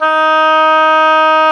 oboe, Oboe Brth

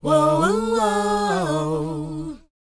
WHOA D#, Vocal, R&B & Dance; ILIO; Vocal Planet

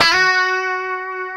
F#4, guitars; COMPR STRAT; Spectrasonics; Hans Zimmer Guitars VOL-1

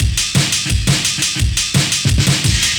Amen, breaks; Music2000

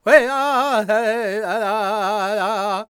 C# MAJ, World Voices, LAKOTA

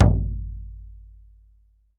BLACKFOOT, American Natives; E-MU Formula 4000 Series Vol. 4; Earth Tones

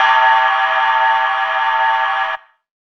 syn, Chillout Synth Atmos; Best Service ProSamples vol.36 - Chillout

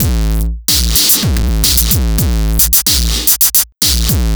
Noise Beat; Drums & Drones

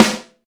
SD BONUS, Drums

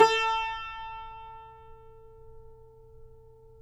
CUMBUS A, Guitars; Spectrasonics; Hans Zimmer Guitars VOL-2

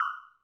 Korg 01W Dance Kit; 300 Drum Machines

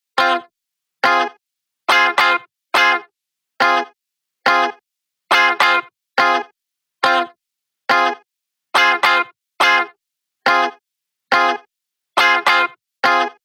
140BPM Fmin Guitar DRY, Offbeat Dub Guitars; Antidote Advent

